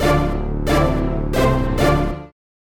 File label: jump jingle loose; xbox360